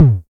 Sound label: clear tom one shot a sharp key, tuned to the A# note. Loudest frequency: 159Hz; tom drum